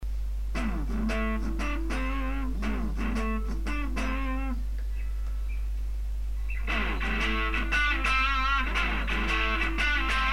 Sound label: Tone